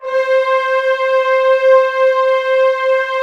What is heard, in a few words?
ORC C4 FF, Orchestra; ORCH C4-C6; Sound On Sound - Peter Siedleczek's Orchestra